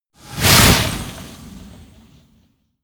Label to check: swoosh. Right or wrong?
Right